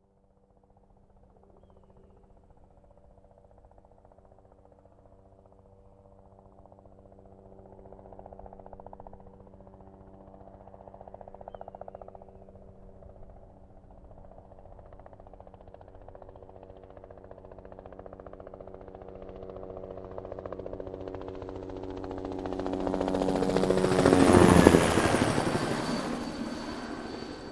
Helicopter deep fly over

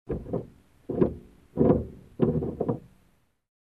Noises outside of car

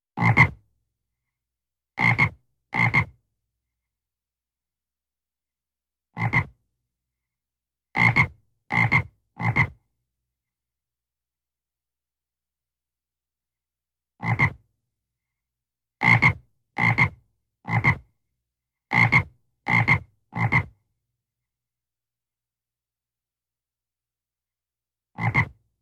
Frog croaking close and loud

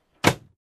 Airplane Cabin Window Closed

